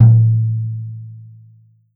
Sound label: Tom Mid; Forzee